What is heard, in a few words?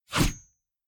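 blood whoosh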